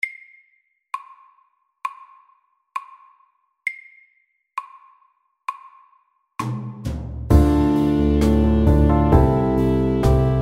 Pop ballad MEDIUM

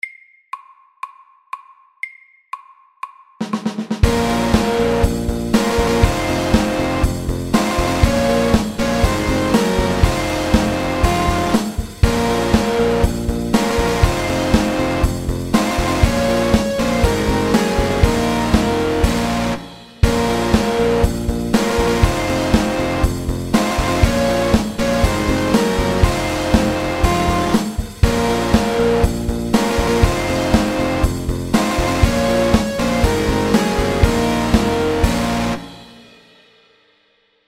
Triplet metal FORT

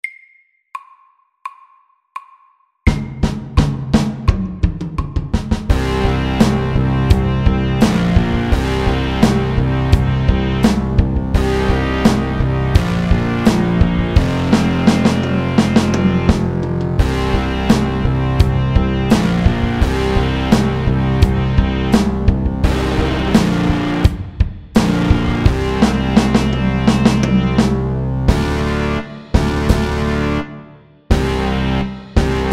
Heavy metal SLOW

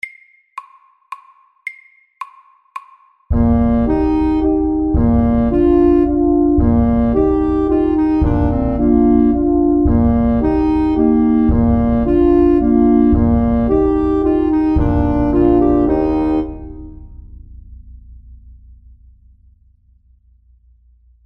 Elegant vals MEDIUM 110

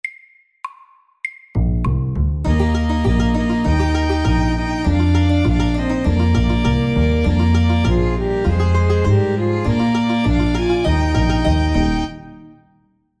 Country and western FAST 100

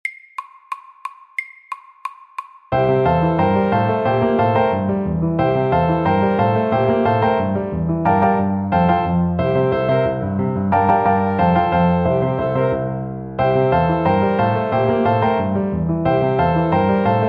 Honky tonk FAST

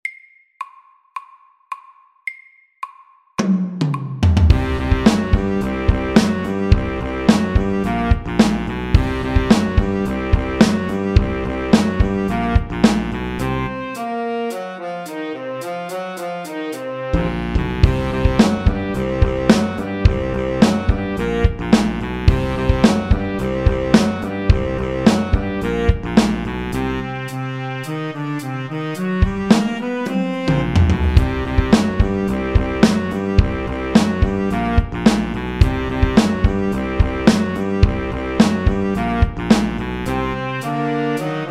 funk, 108

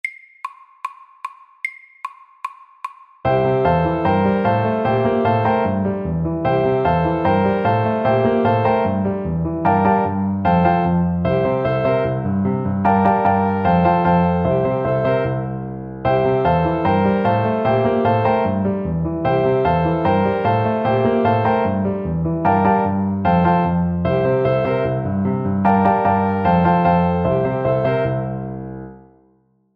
Honky tonk MEDIUM

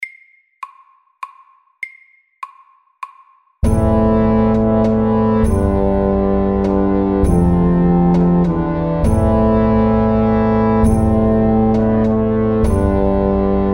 Hemiola

